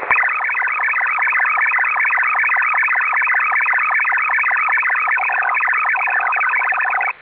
MIL-STD-188-141A, 2G ALE; MIL-STD 188-141B 2G ALE with the attempt to establish a link

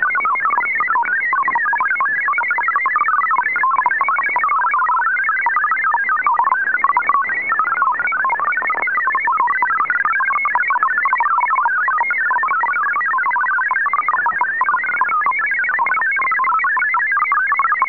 CROWD36 CRYPTO; SERDOLIK MFSK-34 ISS

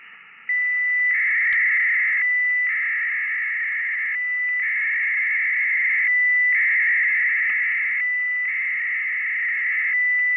468.7 Bd QPSK; Iranian serial tone QPSK waveform at ~468.7 Bd

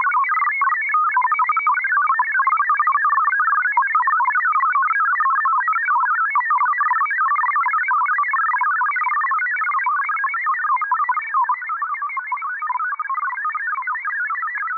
CROWD36 5FG; SERDOLIK MFSK-34 ISS

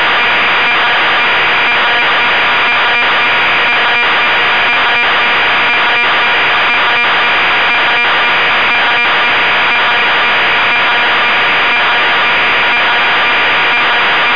VAISALA RS92 RADIOSONDE 2400Bd GFSK; UHF only!

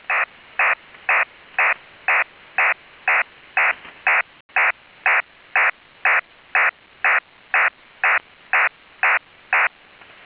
ARCOTEL FARCOS 1800Bd, ALE; EADS/RACOMS; ALE/ALM-systems, SELCALL-systems & synch. sequences

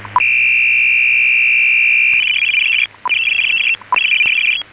TADIRAN AUTOCALL; TADIRAN HF-2000/HF-6000/ / PRC-2200

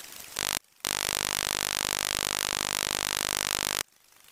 50Hz FREQ-AGILE, OTHR SYSTEM 50 Hz PULSING; ONERA/FRANCE, TX-SITE near Dreux; "NOSTRADAMUS"

